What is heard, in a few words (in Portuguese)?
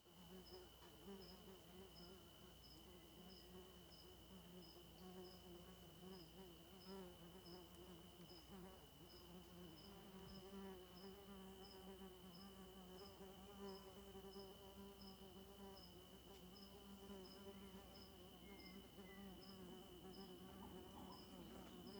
Moscas Rondando o Microfone em Ambiente Noturno com Grilos; Cerrado; insetos; colecao sonora do cerrado